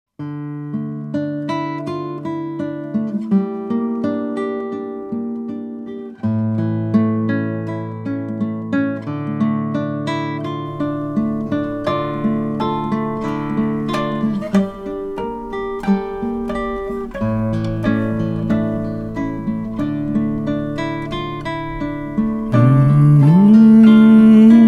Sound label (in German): Schlaflied